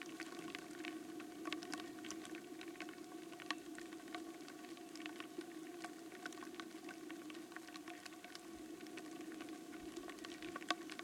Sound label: Env Pipes Loop